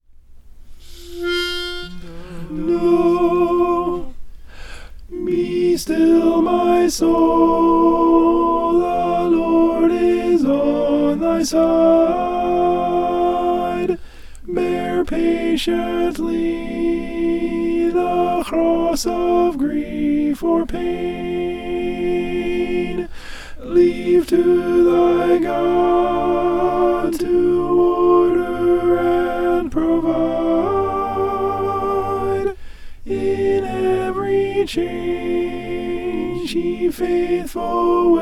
alto

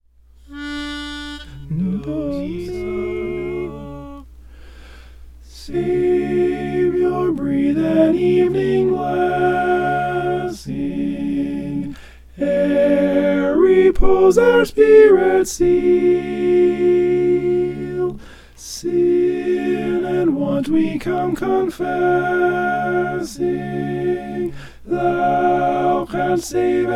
savior breathe an evening alto

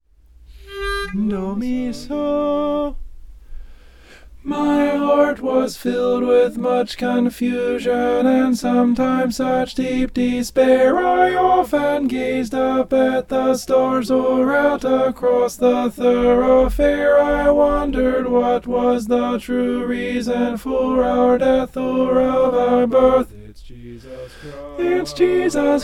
tenor

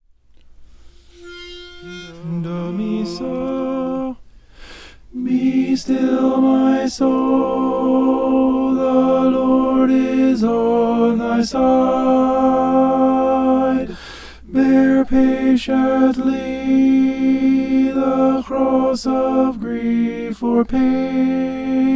tenor

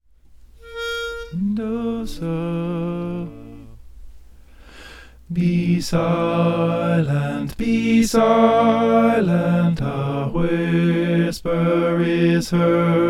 soprano

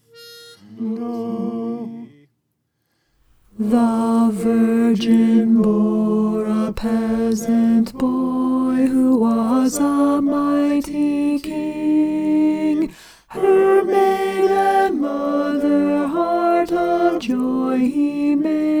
alto

